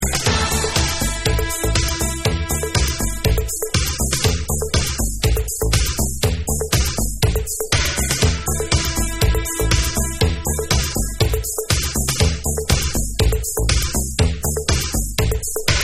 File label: Uk House Mix